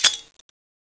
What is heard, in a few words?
shoot empty, m1 garand; tfa cso